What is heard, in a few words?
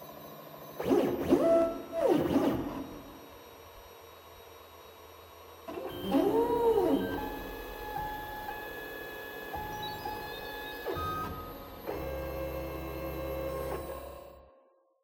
activate; Five Nights at Ronald's